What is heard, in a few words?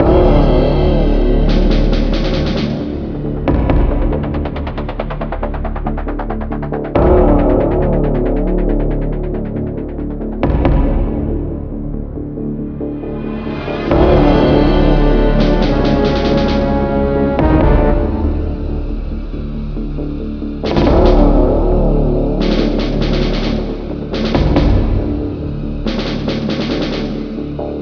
Menu; specops